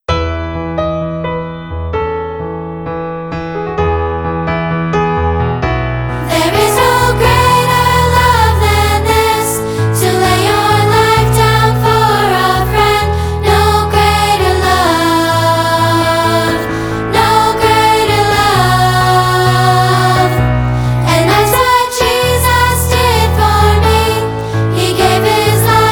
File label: Piano